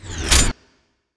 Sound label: revive gun shoot, weapons